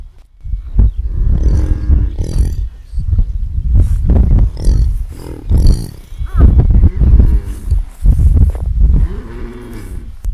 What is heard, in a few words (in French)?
Mongolie Arkhangai troupeau